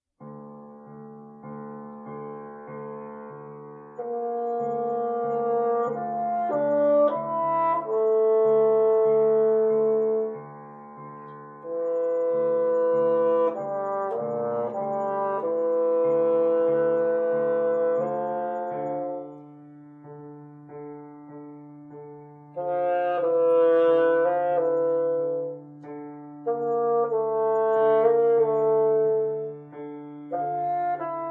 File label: Bassoon Sonatina